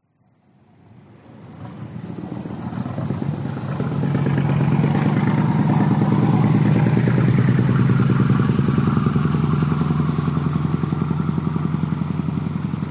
boat old noisy leave harbor singapore; changi village

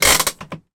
van handbrake applied; transportation; sound effects; sound effects one

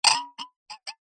household glasses drinking x2 clink together

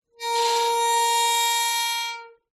OLD STUFF Iron Door Swinging

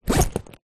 bag camera bag zipper; foley

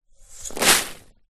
food popcorn spill onto carpet

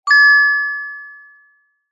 multimedia alert chime ping high pitched

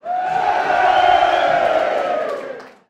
human crowd approx 150 people cheer indoors

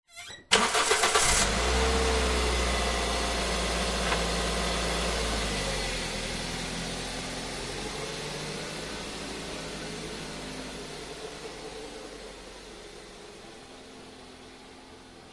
car start in garage reverse away birds

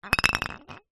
food drink beer bottle full roll concrete ground; zapsplat

